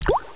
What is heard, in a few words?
PLOP